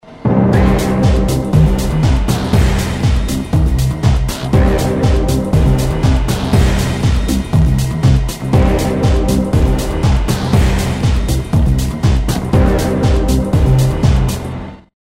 MACHINES

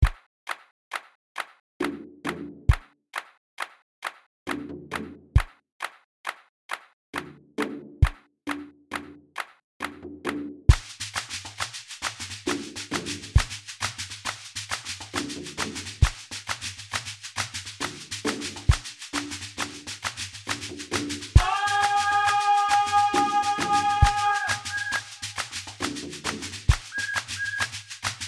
PERCUSSION BOSTWANA

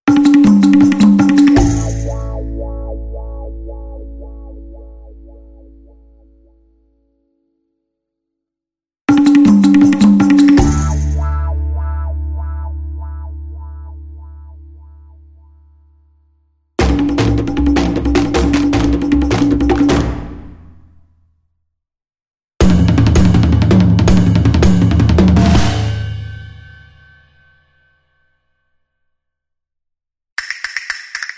JINGLE PERCUSSIONS

